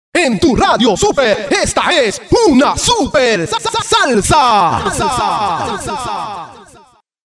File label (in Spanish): rompecorte una super salsa